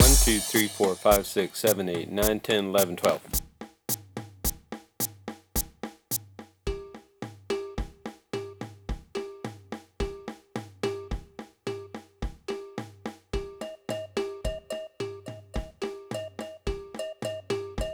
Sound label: Drum Complex